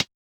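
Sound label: Hi-Hat Low Closed; Yamaha DJX-2B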